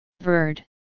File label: bird